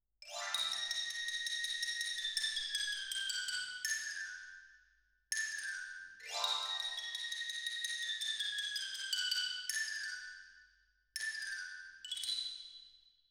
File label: Twin R Xylo